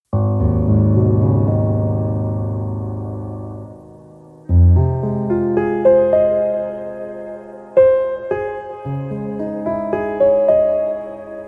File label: On Thin Ice, Piano Only